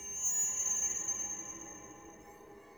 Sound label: Day Texture One, Ambience; Glades Of Andurin